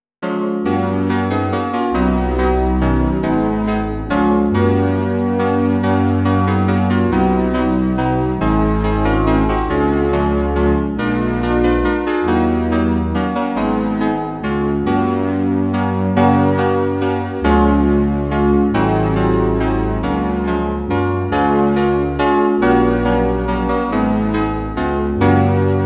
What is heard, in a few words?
We Wish You A Merry Christmas Synth